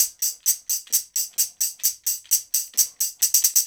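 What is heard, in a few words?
TAMB, tambourine; Drumdrops In Dub VOL-1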